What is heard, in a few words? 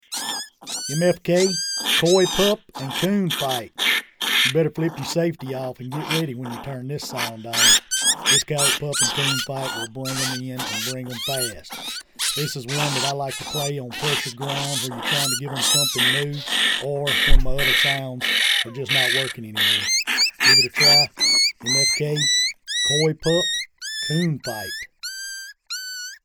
Coy Pup Coon Fight